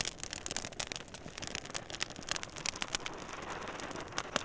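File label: fire small; kingpin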